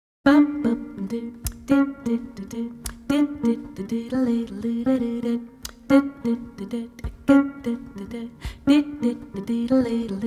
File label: JAZZ